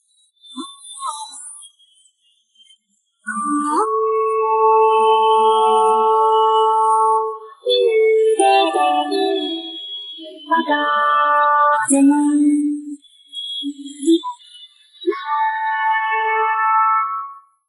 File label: vocals; spleeter